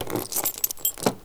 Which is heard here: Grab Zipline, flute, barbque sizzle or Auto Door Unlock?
Auto Door Unlock